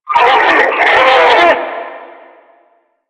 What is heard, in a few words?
Death; Warframe; Lasrian; Lasrian Enemy B